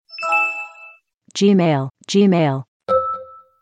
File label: gmail x2